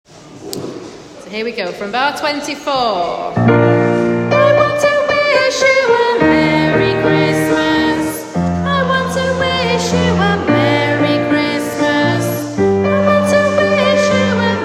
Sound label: alto